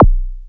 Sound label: BD, Drums Dance & Groove; USB Soundscan vol.11; AKAI; ALL BD